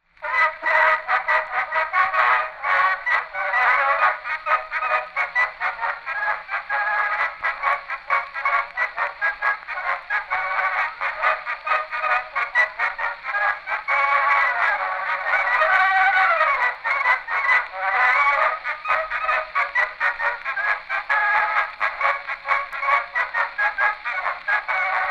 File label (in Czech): fonograf